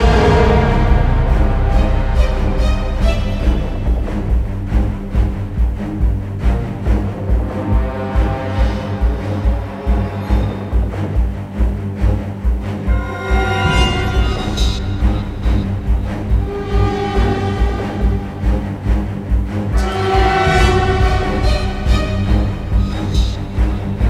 Action FULL Loop 140BPM, Classic Horror Sci-Fi Music; Dead Ark